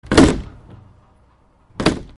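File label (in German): dixiklotür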